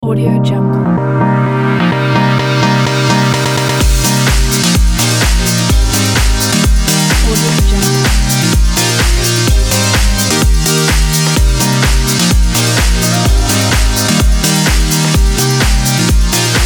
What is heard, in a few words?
pop dance ident; seatools